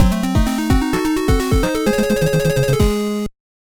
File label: surprise